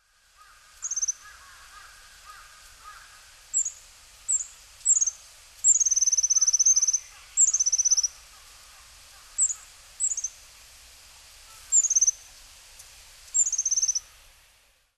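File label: enaga, Long-tailed Tit; hyogo; koe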